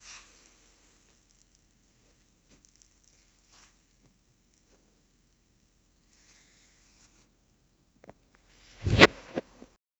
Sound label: cartoon character slipping on a banana peel